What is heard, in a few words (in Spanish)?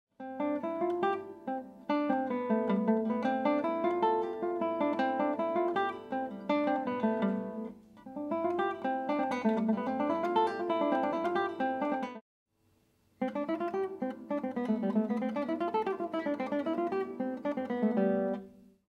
Escala; Bach